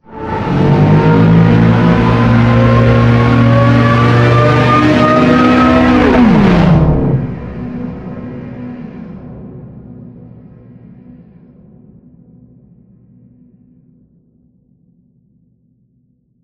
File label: cables pulse; Half Life Alyx; music; vault